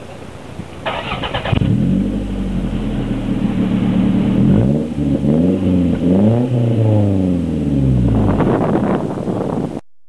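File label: 4SFE ST202, muffler, TOYOTA CURREN; FUJITSUBO レガリスＲ (ST202用）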